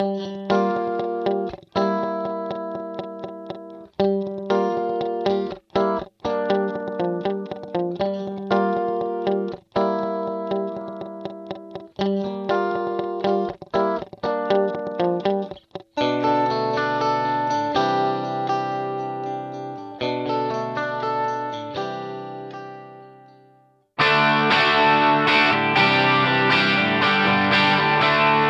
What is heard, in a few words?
Guitar Yamaha D5000 Stereo Chorus Vintage Digital